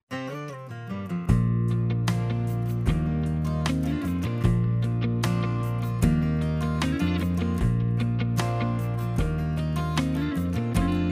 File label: country